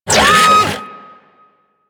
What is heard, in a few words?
Thumper Bot Servo C; Warframe; Grineer